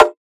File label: drum rim untrue; Virtual Riot; Drum Hits